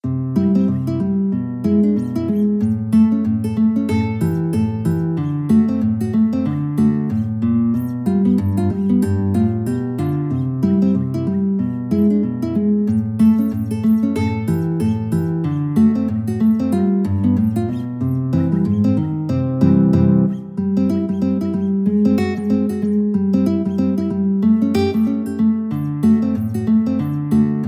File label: rag, guitar